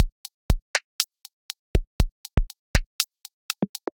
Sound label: Carbon Kit, Drums Synthesized; Ableton; Factory Packs; Drum Essentials